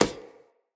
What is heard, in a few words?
Unarmed Fey Parry Impact; Weapon Impacts; Player; Combat